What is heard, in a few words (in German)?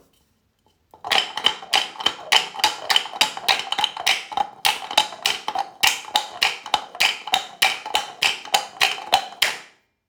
Galopper Sound